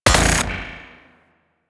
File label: Shot B, Charge Gun; Cycron